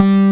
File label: S FUZZ BS, DANCE; Pro-Rec - Synthsonic; Z1 DANCE